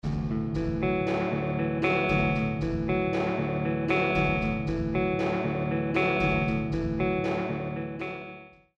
leichtes vibrato

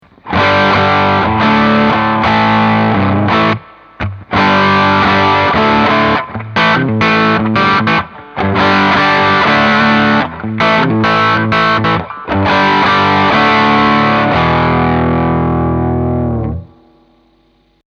Fender Hot Rod IV Deluxe Drive Kanal Drive 12 100

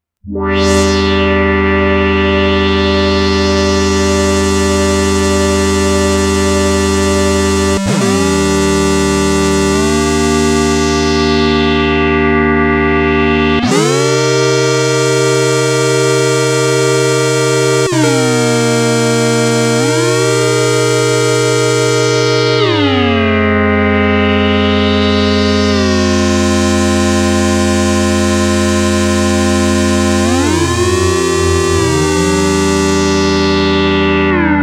Polymode mit Portamento

